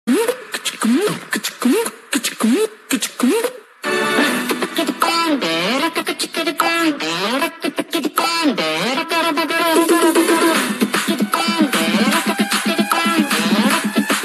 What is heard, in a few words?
Mobile Ringtone